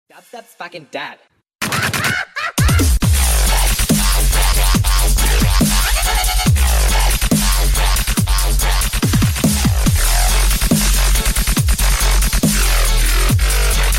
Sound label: dubstep ringtone